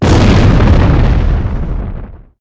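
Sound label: exp large generic, explosion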